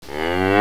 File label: pain; openffa; puffin